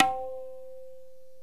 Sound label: SARANGI TAB